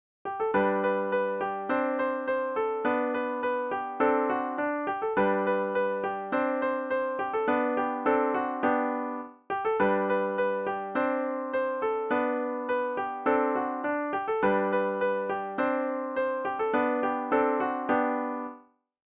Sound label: piano